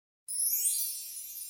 Cute Notification Tone

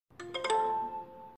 Notification; Oppo A93

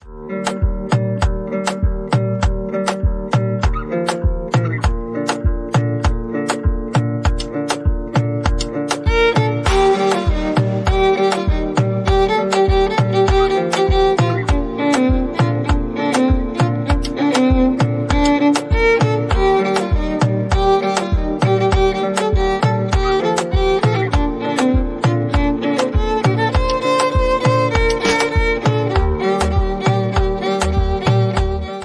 Sweet Violin Ringtone

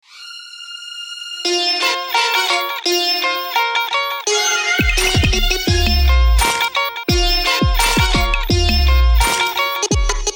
Bollywood Dance Ringtone

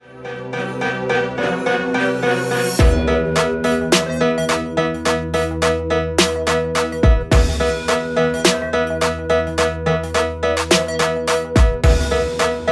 Music Ringtone

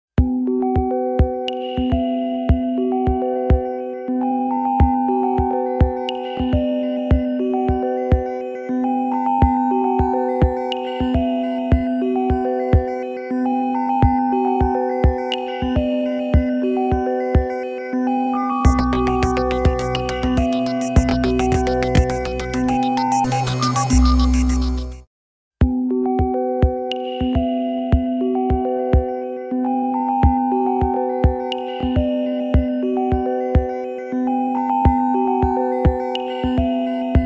Motorola Ringtone

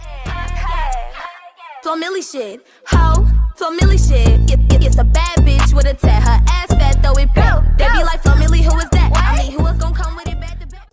English Ringtone

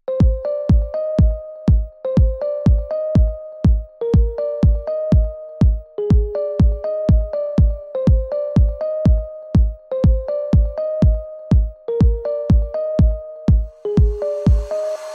iPhone Crystals Ringtone

